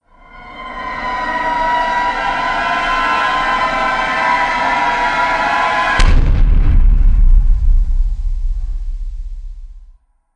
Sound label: Movie Impact Night Terrors; miscellaneous impact; Miscellaneous Impact Sound Effects